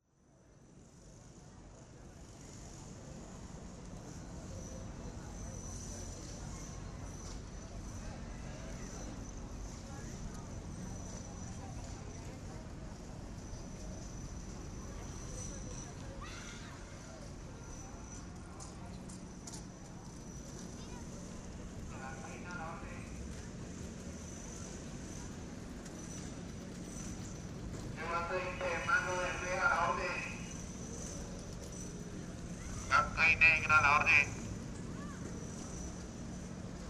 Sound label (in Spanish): Paisaje Plaza de Caycedo